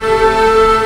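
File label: STR A0 PP, strings; Sound On Sound - Peter Siedleczek's Orchestra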